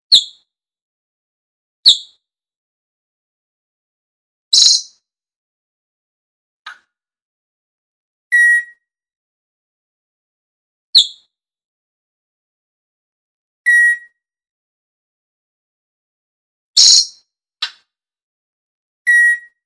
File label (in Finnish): papukaija